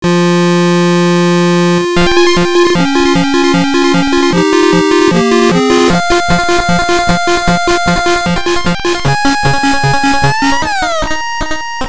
(Eurobeat Version); Dance Dance Revolution GB Disney Mix

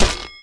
Environment Collision; lego ninjago rise of the snakes

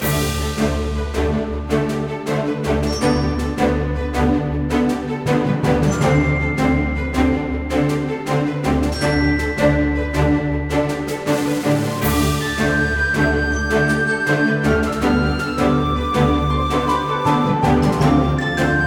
Battle Loop; Regular Battle 02; Steamworld Quest Hand of Gilgamech; nintendo switch

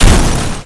Car Crash; Nicktoons Nitro; arcade

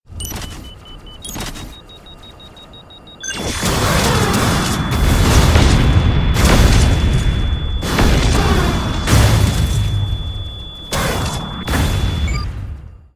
Incoming Transmission Lock-On Missile Launcher; Robocraft

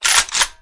Gun reload; 30 Days to Survive

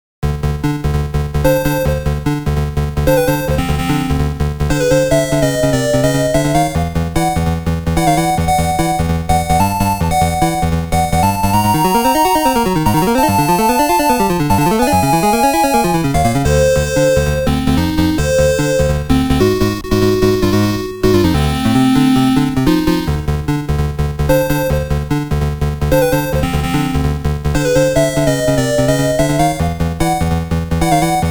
Stage 2 BGM

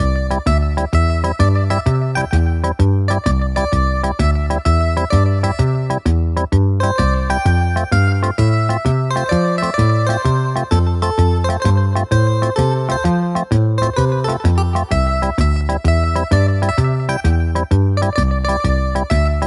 CASIO Shufle; Wunderling

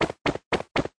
Map change

